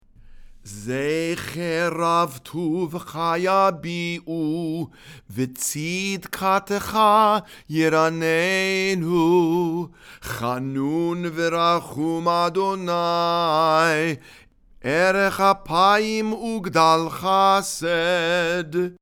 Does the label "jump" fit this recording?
No